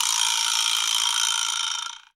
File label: vibraslap